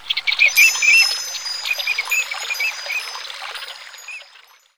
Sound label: LindowsOS Startup Nature